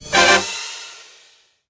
near win end; disco danny

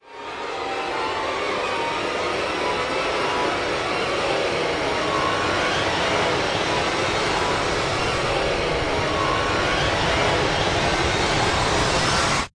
Reel Near Win Snd; mythic maiden

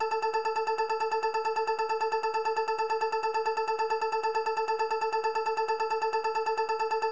count up loop; elements

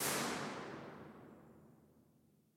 dungeon Reverb IR; godsofgold

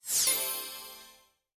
money Zoom; joker pro

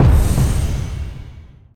button Energy Release; elements

